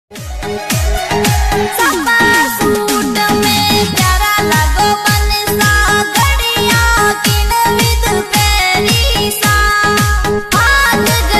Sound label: banna banni ringtones marwadi